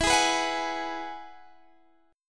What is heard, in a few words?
G sharp Minor 7th Sharp 5th E